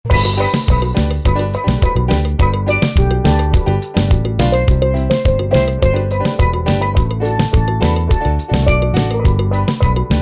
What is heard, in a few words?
Steel Drum